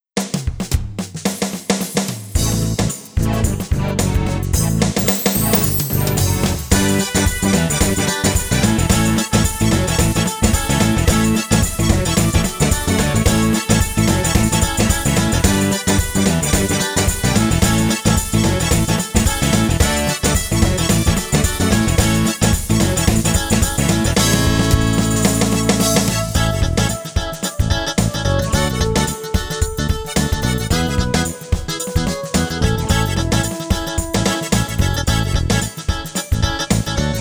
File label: karaoke